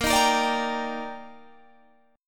Eb Major 7th Bb

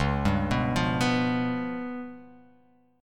Db 7th Flat 5th Db down; Db7b5 arpeggiated; JGuitar

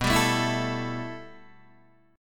B 7th Suspended 4th Sharp 5th, Guitar, Open D

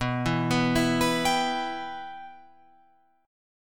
B Suspended 4th Sharp 5th B Guitar Open F; Bsus4#5 arpeggiated; JGuitar

